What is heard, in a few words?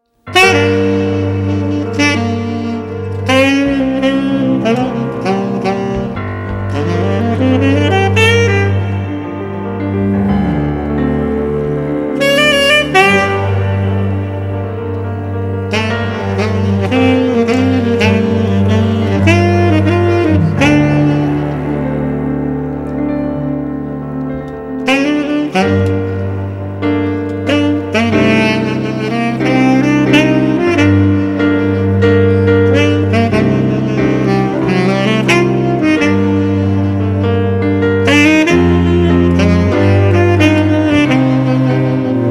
cry